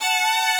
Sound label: violin